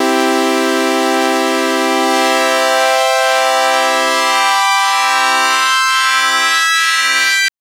Synth SyncSweep maj c3; TrashLibrary

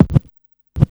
RB 92 BD FX 1, Hip Hop R_n B; USB Soundscan vol.19; AKAI

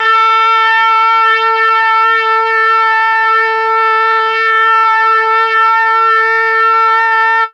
MEL BRASS; ILIO; Propeller Island; Legendary M400

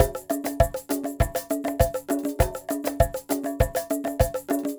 Ensemble, Latin Percussion

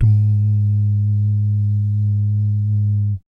BS DUMMP; Spectrasonics; Vocal Planet; Jazz FX

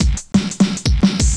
175, Breaks; EZRollers

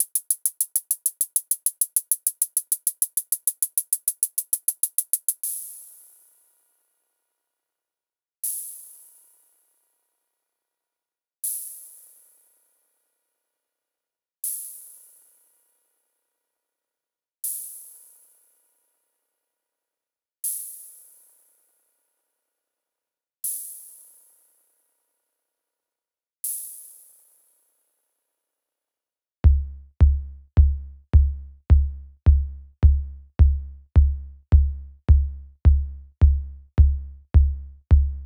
Sound-Master SM-8

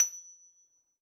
F, Harpsichord; Total Piano

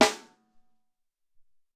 Hi Snare, Alesis SR-18

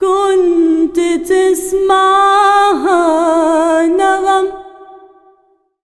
SINGER, ArabianTraditions

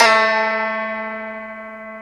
Gzheng A2; Planet Earth; Digital Sound Factory Planet Earth

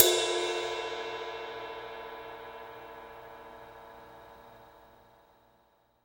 RIDE, Drum Dry; East Collexion; Drum 1 Dry

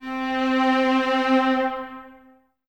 String Ensembles, Violas

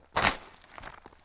Rocky Step, outdoor, Footsteps

